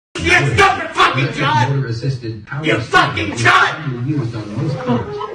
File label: chant